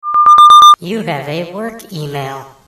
Work Email Notify

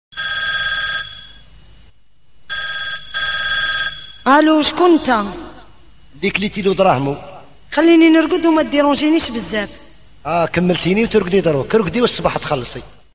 Ring Maroc

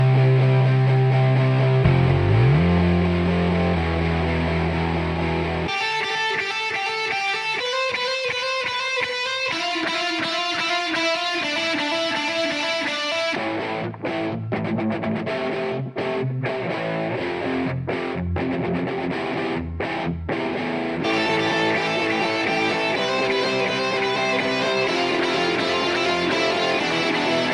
Rock and roll

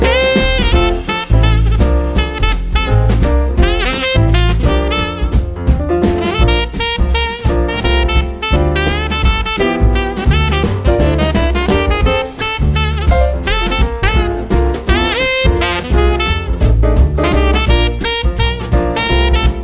Jazzy Christmas Sax

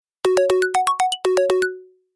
Modern Ring

